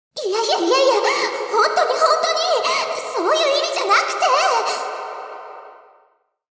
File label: alto; 明るく無邪気で天真爛漫。天衣無縫。純真無垢。